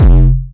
Syn Dr, Overloaded Synthetic Drums; style kits

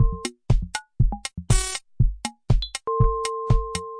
120 bpm, broken videogame, drum loops and breaks

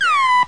R2D2 disappointment; UNUSUAL KITS and FX; R2D2 Construction Kit

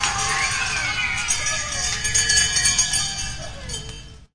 carnivale; Sound FX Real Life

